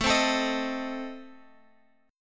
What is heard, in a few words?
A Diminished A, Guitar Open F; strummed; chordshape; 21,19,21,22,x,x